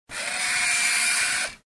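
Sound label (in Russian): zoom fotoappar, camera zoom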